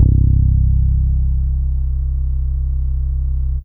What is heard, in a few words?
Rubba Bass C2, Fairlight CMI IIe